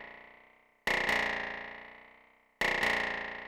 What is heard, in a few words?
FEEDBACK, Techno 138 BPM; Best Service ProSamples vol.54; AKAI